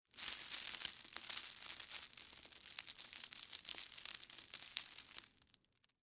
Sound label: Debris Ice Cream Cone, Soft; Blast Wave